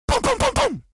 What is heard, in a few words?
boom